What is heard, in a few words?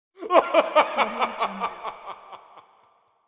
evil laughter